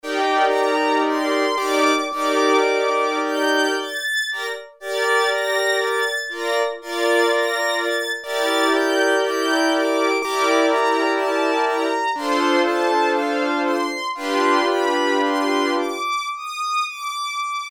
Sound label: ROSIN STRINGS, Oberheim OB-8; Factory Patches